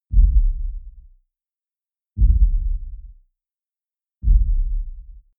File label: coloss step impact sub distant soft; schritte